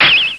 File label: shuriken hit wall; jailbreak